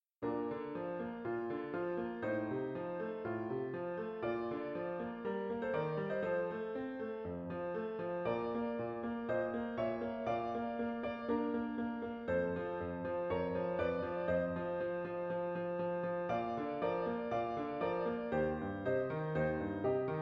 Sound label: d3 piano; byu theory